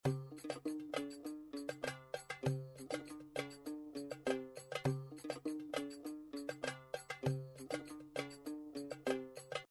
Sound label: music background egyptian